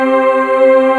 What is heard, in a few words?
DIGI WAVE, DIGI PAD